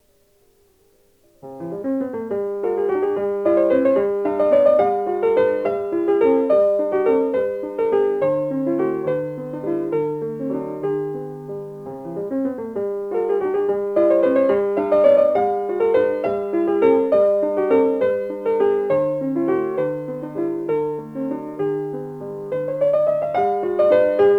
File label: Valse